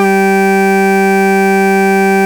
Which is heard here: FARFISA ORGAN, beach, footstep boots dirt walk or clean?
FARFISA ORGAN